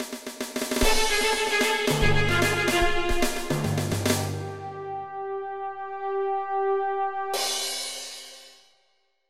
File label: Shelter; Jagged Alliance